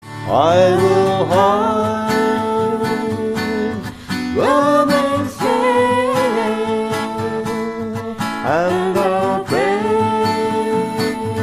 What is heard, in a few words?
chorus; Sea of Storms